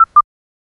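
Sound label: sound effect for a website, user interaction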